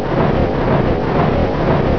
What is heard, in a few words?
whooshy fan